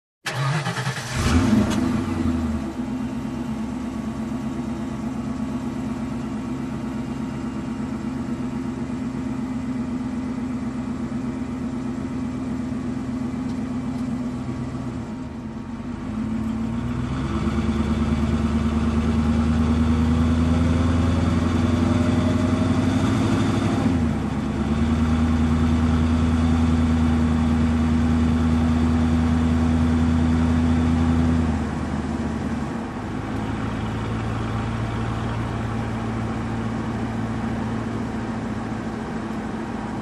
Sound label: Truck engine ignition and drive around